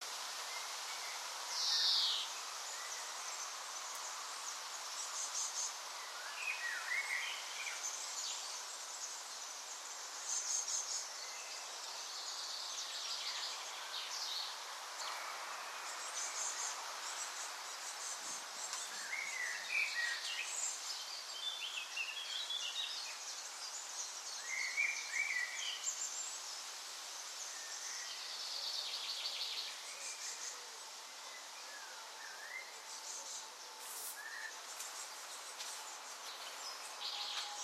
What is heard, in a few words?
birds balcony clean With Thunderstorm